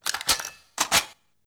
smg reload; weapons

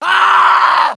die; worm, bf2142

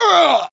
death; cj

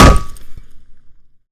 pan hit, frying pan, melee; weapons

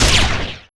pulsar shot, railgun

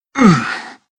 zero med pain

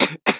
cough

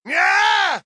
hurt med; joker

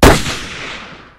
Sniper Rifle

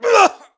cough; worm, bf2142

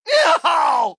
hurt heavy; joker

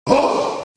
death; worm; billy

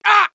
hurt med; cj

